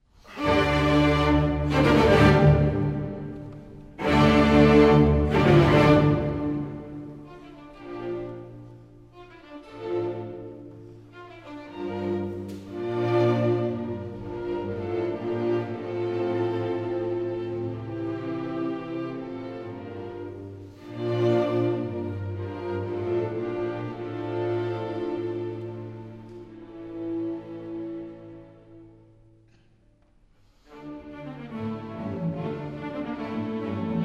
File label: String Quartet; Schubert; Mahler